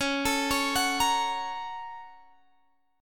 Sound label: A sharp Minor Major 7th Sharp 5th